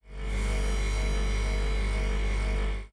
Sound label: energy; enemies; aquariumboss energy; unsighted